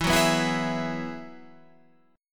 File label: F sharp 9th Suspended 4th E, chord